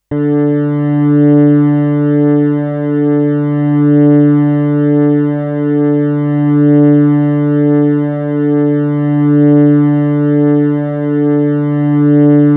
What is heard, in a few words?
Fourth String D; synthesised notes, each about a minute long; guitar tuning; 4th string D